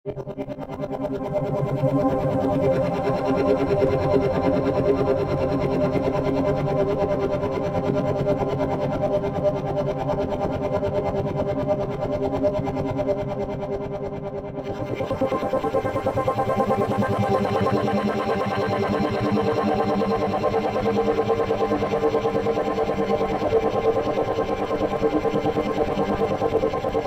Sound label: Drones Central Brain Static